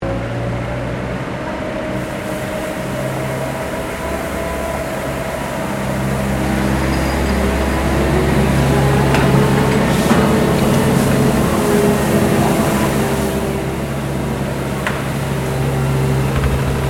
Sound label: Sweeper Street Small Operating; Construction Vehicles